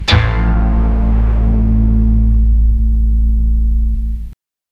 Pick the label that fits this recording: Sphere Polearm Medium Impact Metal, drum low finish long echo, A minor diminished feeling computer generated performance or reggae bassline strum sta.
reggae bassline strum sta